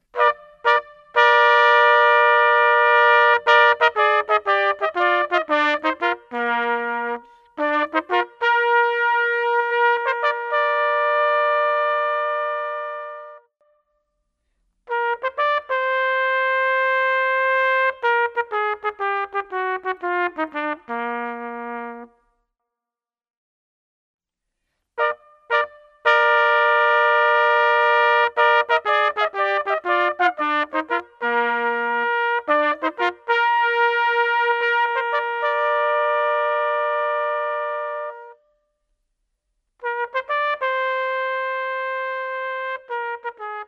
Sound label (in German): Ein Fest fuer die Natur Trompeten